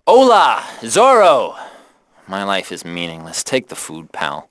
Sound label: band; GTA - Long Night